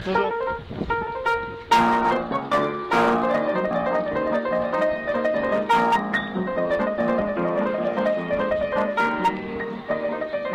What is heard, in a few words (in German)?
Unbekanntes Klavierstueck